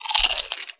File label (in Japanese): ポテチをかじる音